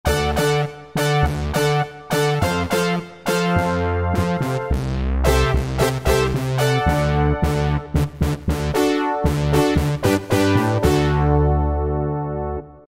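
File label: Brass Obersync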